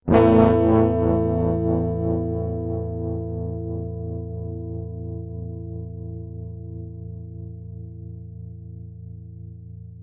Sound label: Notes A Minor; gamerip; Hylics